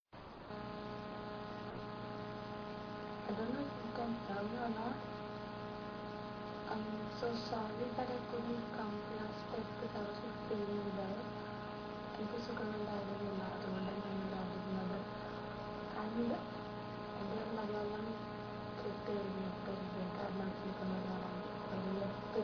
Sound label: gunshots; alternative